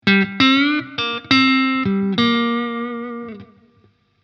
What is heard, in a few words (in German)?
Blues Licks